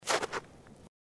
footsteps snow

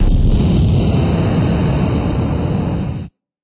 fire magic large; 魔法/法术的音效

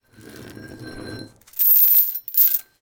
Footsteps Chain

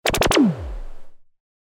consecutive impact, mahjong; ボードゲームやカジノ、麻雀の効果音です

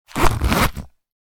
Wallet zipper

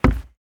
footsteps wood

